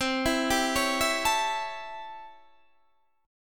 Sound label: C 6th Flat 9th C; arpeggiated